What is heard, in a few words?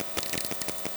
chirp; physics radio